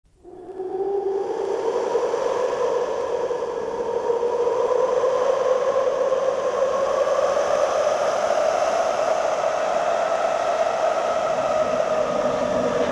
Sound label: Middle Monster Wave; S7 Mazu